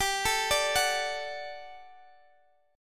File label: G Major 7th Suspended 2nd G down Guitar DADGAD